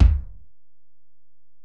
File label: Ac BD, Alesis DM-PRO; 300 Drum Machines